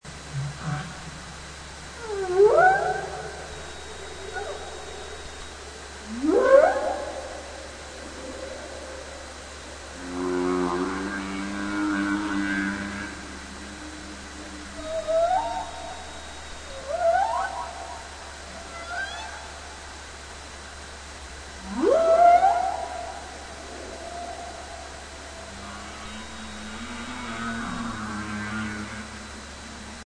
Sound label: Humpback, Douglas Channel; Oceans Initiative; Ringtone